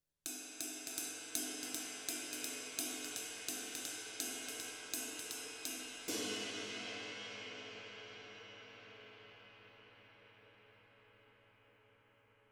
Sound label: 22 RIDE JAZZ, cymbals